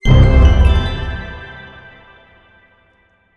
medium Win Tonal C; netent

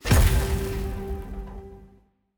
Scatter Hit; fruitblaze

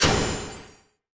butter Land; netent

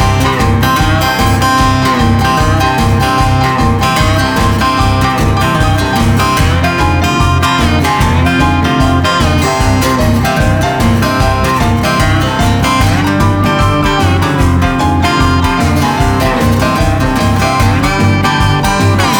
super Mega Win Music; netent

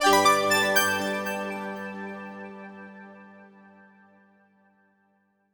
small win; Stikky; netent

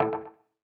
Quickstop; WildWater

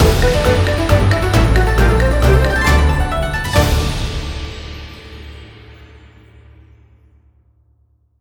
big Win End; netent

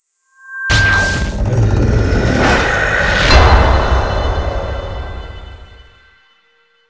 dark King Wake; netent

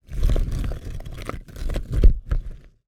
rock Slide

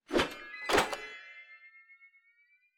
multiplier Up; netent

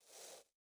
win banner hide; netent

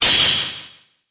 near Win Flash; netent

